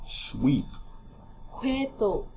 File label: sweep